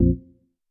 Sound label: Tek Hit